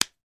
toy baby toy wooden puzzle pieces hit together

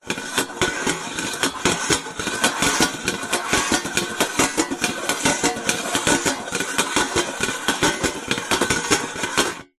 foley metal tray lid rotate turn spin on metal

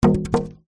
foley polystyrene piece long drop ground

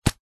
leisure toy slingshot rubber band shoot

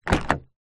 transport car door open external toyota corolla

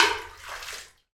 water splash flooded bunker; sound effects one

